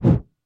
zvuki postukivaniia paltsem po mikrofonu

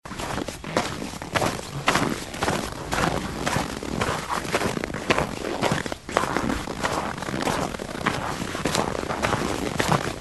zvuki zimnego lesa

